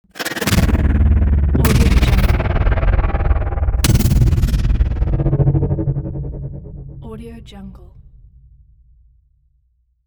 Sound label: transformation logo